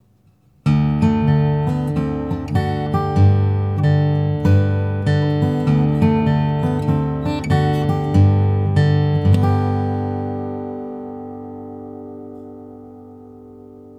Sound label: vamp, slack key